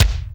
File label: HOUSE KICK; housey drms